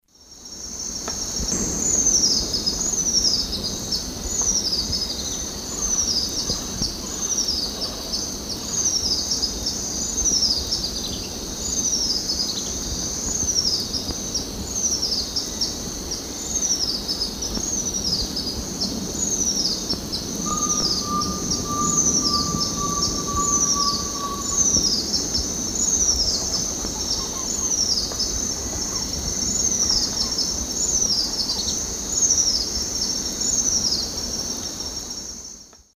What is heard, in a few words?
Phaethornis bourcieri, song; S. America; Eastern Lowlands